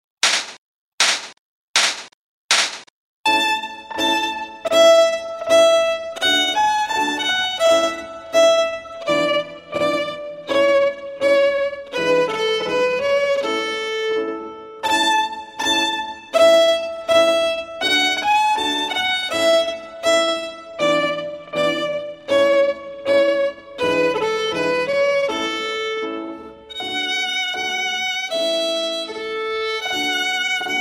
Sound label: ALLEGRO LENTO